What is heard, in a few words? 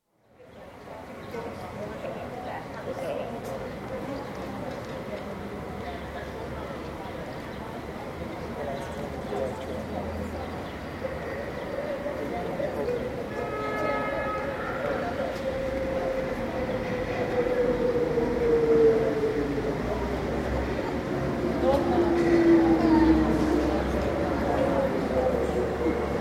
Bus station, Pecs, fairly quiet, with footsteps, speech and some bus noise; Hungary; BBC